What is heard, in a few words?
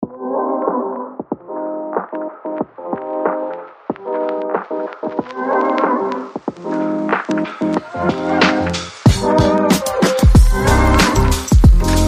jazzy abstract beat